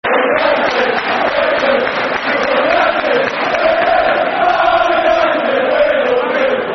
Football Chant (Soccer Song), Man United; We Love United

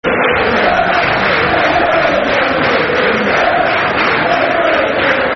Football Chants; Reading; De De Leroy Lita; Reading Rfc

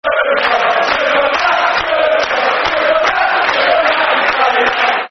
Football Chants (Soccer Songs); Super Matthew Taylor; Portsmouth Pfc

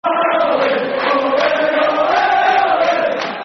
Football Chants; Super Ali; Rangers GRFC

